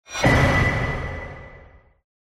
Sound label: new item; elden ring